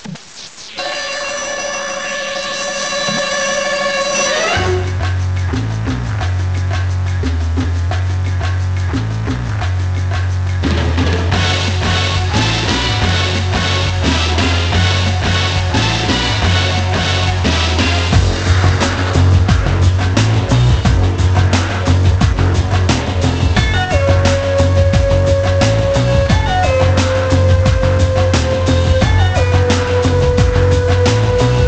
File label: MUSIC